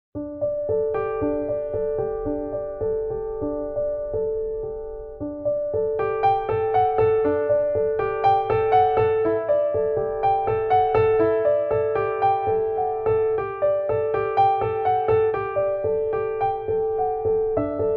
Solo Pianist

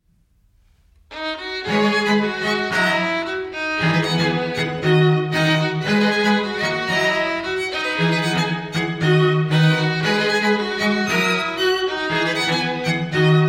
String Quartet; Manchester String Quartet

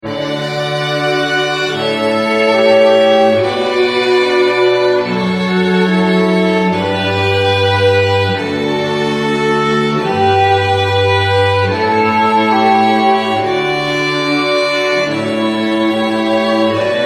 canon

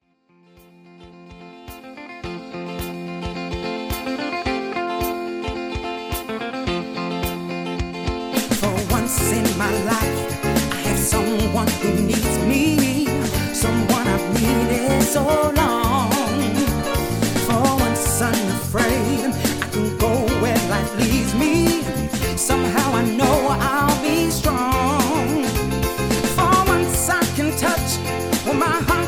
Singing Waiter

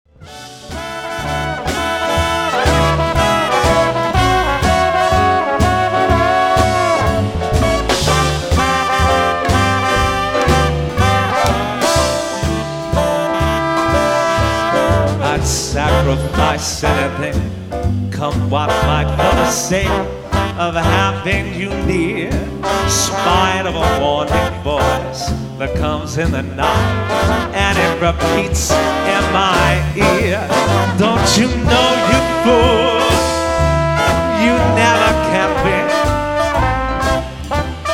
Swing Band Live

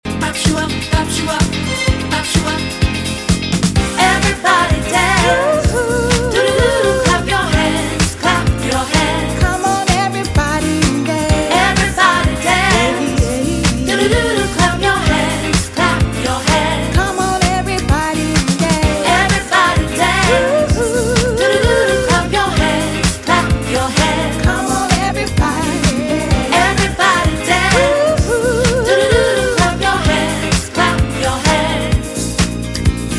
Disco; Disco Deluxe; SLEEKE DISCO DIAMONDS

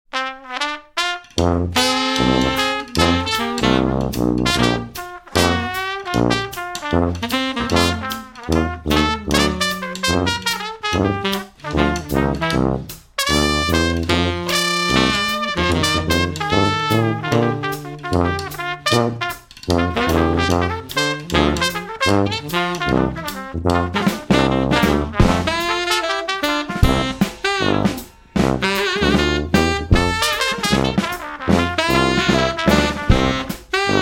Horns

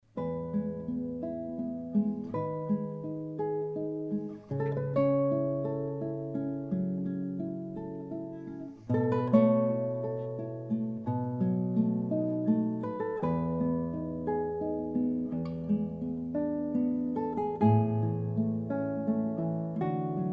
Solo Guitar

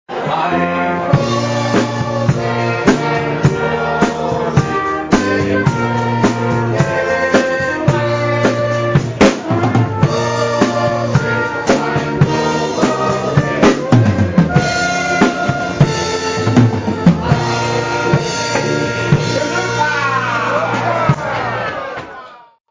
oompah

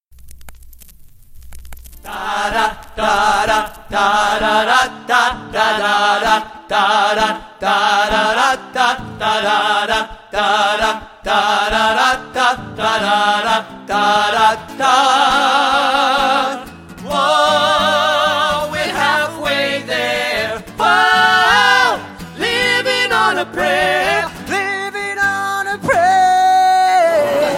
simply singing waiters melody

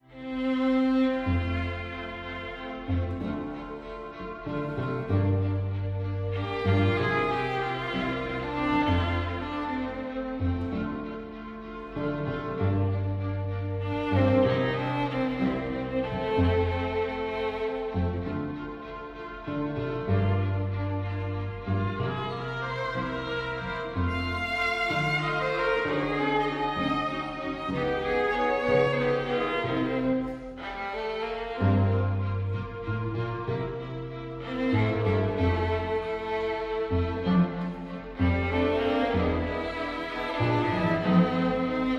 String Quartet; Soleil String Quartet

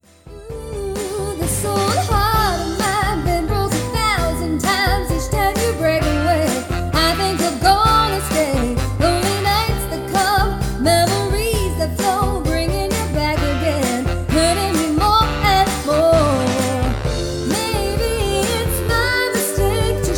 Motown Duo Live

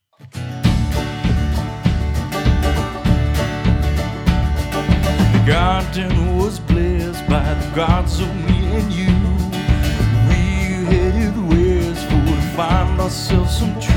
Folk Collective

